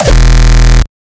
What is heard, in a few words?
Euphoric Frenchcore kick